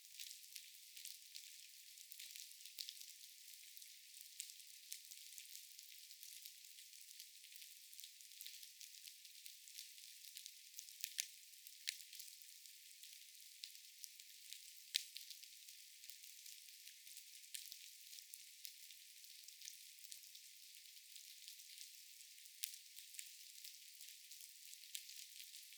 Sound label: Snapping Shrimp